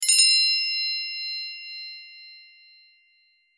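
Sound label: sms received, iphone os sounds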